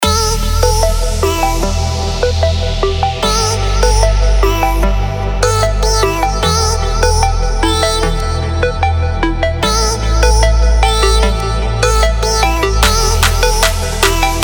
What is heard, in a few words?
Melodic Trap